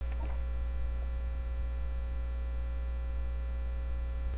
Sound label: groan